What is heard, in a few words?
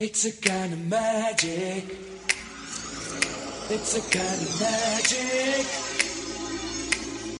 new english song tone ringtone; samsung ringtones